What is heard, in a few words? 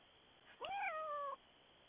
cat meow